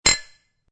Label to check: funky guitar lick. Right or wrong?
Wrong